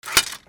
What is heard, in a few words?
HOUSE